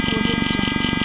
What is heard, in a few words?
scifi machinery loop